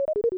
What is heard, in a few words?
Called for help; mother2